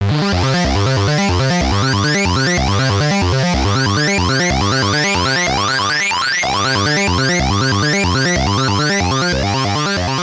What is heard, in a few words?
Ring Sync FM PW mod per ENV; Behringer K-2 MK 2